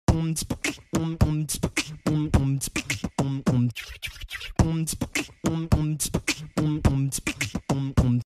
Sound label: cute tone for ringtone